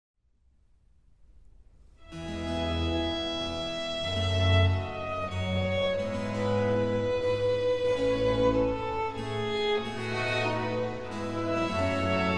Concerto For 2 Violins in D Minor, Largo ma non tanto; BWV 1043